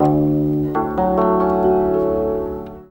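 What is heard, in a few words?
ZIMBAL MELODY; Best Service; World Colours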